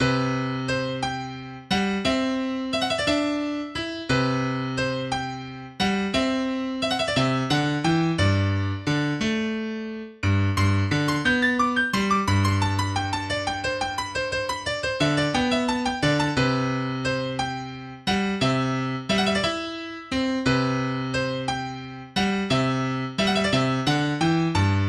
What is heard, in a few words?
Piano; Snow Wood Boarding School